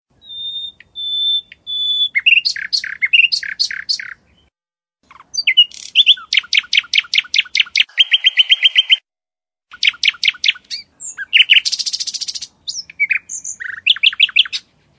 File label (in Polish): dzwiek ptakow